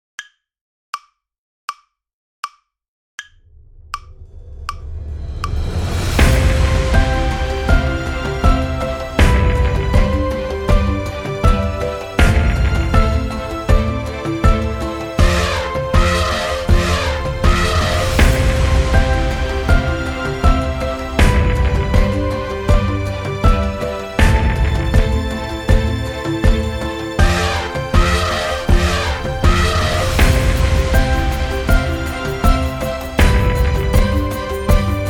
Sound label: 80bpm